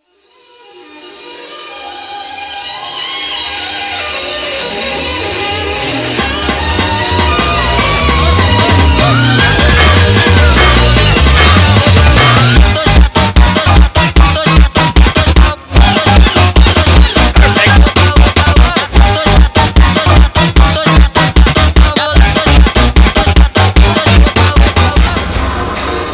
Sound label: funk sped up